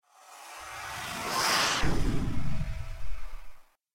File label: jet pass win; kritter krawler